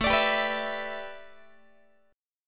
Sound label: A 7th Suspended 2nd Suspended 4th A, Guitar; strummed